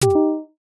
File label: cancel dry; oneshot